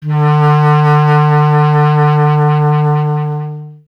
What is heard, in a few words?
B. Clarinet